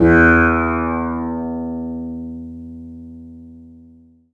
tabla sitar E; Best Service ProSamples vol.55 - Retro Sampler; AKAI

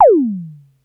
SYSTEM100, percussion; Giga Samples Collection

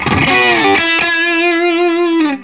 SOLO E, Vintage Blues Guitar; USB Soundscan vol.22; AKAI; SOLO E125

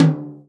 tom, Real Drum Kit, jungle kit

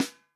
REAL DRUMS FUNK KIT

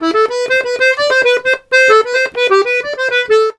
TARANT, Complete Accordions; USB Soundscan vol.40; AKAI

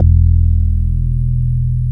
WOOD BAS; Synclavier Sampler Library; KEYS AND GUITARS

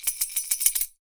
YAQUI; AKAI S6000 CD-ROM - WorldSounds

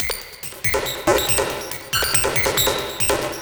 Processed Perc

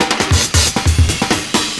FILLS, 70_s Breakbeats

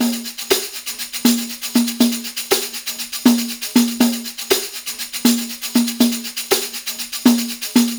PERLP, ArabianTraditions

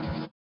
SWUSH; Spectrasonics; Metamorphosis

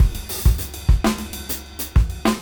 Cym Funk 99 BPM; AMG - Keith LeBlanc Phat Butt Jams

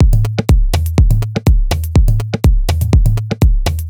A# 123bpm, House Drumloops; Balance; Zero-G House Fabrik Drumloops

